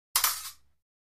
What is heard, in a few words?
Fencing Swords Slid